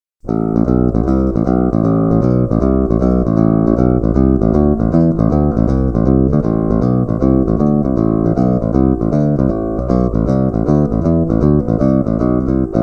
Bridge, bassblaster; szeregowo

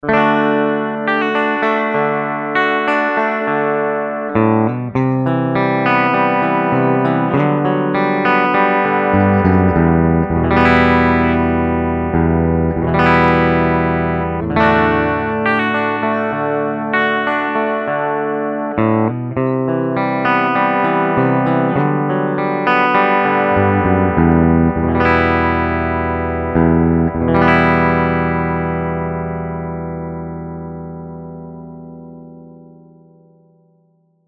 Sabotage Bridge Humbucker

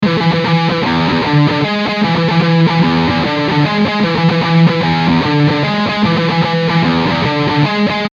NECK drive V, eclipse active line6

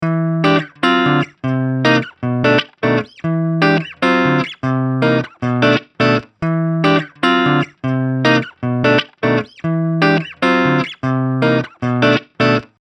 NECK clean; eclipse active; line6

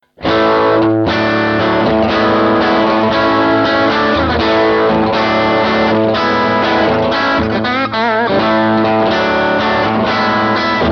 bridge crunch

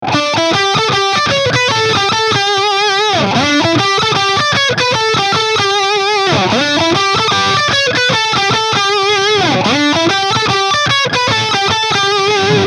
BRIDGE, 5150; eclipse active

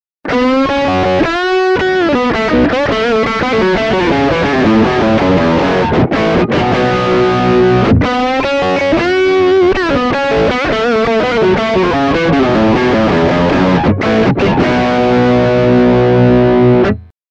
PAF Neck Drive

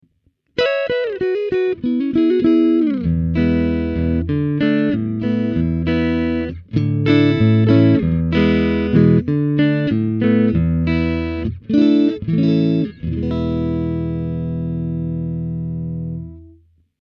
Neck (Single) Clean

